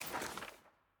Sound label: ui record next click; jinx